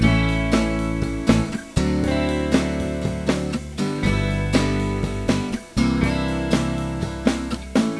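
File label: Loop, synth fx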